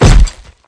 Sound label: cbar hit bod, weapons; svencoop; legends